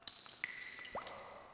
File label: drop, CAVE; AMBIENT; Pirates Of The Caribbean